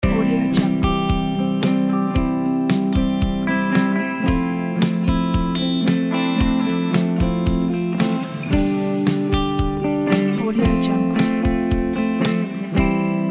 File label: sunny uplifting classic pop rock